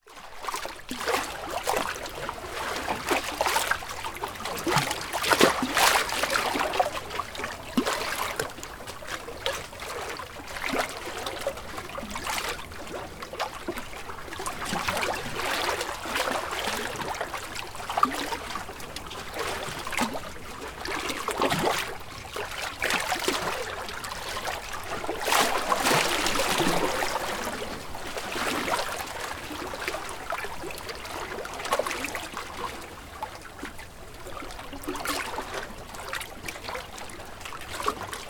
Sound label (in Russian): shum voln